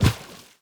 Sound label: Player Footstep